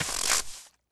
snow footsteps; zps; Foley

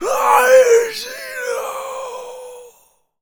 Male Berserk, Zombies; zps; Male1

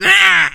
pain, Zombies; zps; Z Punk

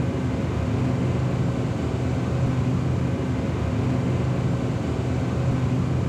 Air Conditioner; Ambient; zps

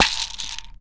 Sm Health Pack; zps

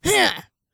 attack, Zombies; zps; Vanessa

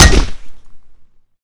Aluminum Hit, Bat; zps; Weapons; Melee

